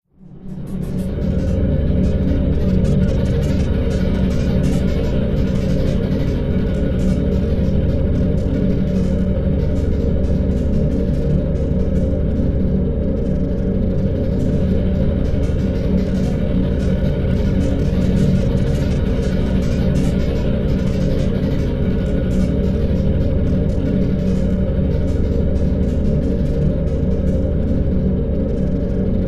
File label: Light Active Machinery Ambience Machinery